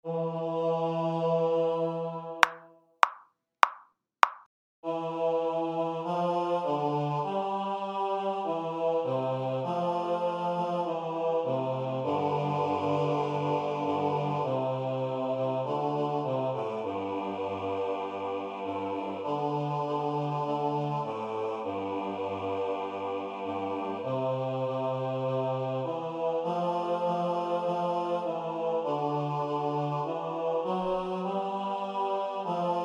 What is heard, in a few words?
bass